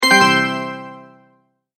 multimedia tone end

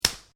Branches Dead Snap; Material components for constructing more complex sounds; Snaps (Sound Effects:Objects)

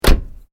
CAR SUBARU IMPRESA DOOR CLOSE; Hire Car in japan; Cars (Sound Effects:Vehicles)

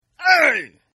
Grunt; Grunts; Voice

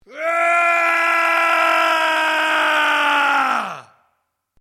MALE ROAR AGGRESSIVE LONG; Yelling; Voice

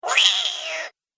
Gremlin Stroppy, monster; horror sound effects

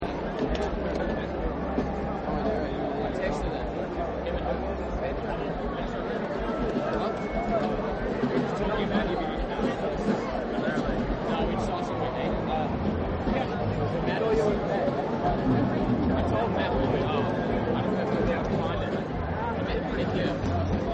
Rock Concert Ambience, Applause; Human

